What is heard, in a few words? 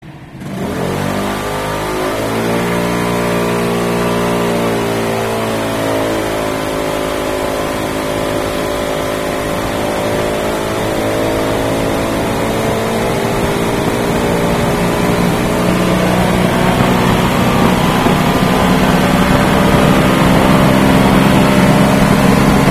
Sound c75, scooter